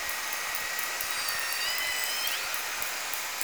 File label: call (L pod), Orca Calls